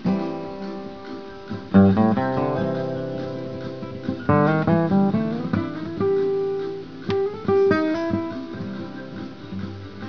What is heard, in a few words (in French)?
ETHNIQUE